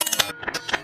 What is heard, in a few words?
Data Corruption, stinger